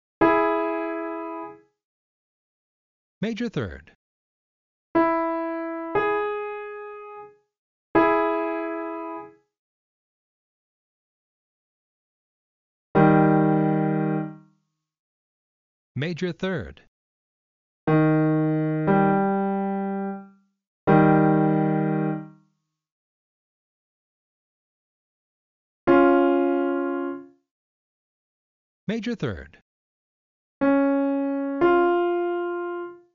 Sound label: m3, M3 (harmonic), intervals